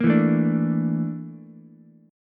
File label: A sharp Major 7th Flat 5th A sharp Ukulele; A#M7b5; strummed; JGuitar